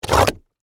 Suction Mud Gurgle, Texture, Suction, Wet, Gurgles, Mud; Water Sound Effects; Water (Sound Effects:Nature)